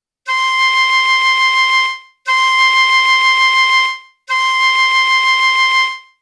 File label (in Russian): 2 oktava 1 do flejta